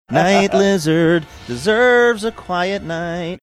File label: singing night lizard deserves quiet night